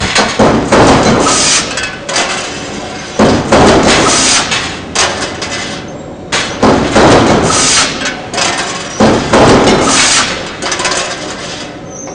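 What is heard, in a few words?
ambience industrial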